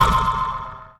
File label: Stab